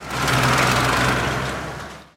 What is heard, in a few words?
ambient farmyard tractor pass by; talking tom gold run gamerip 2016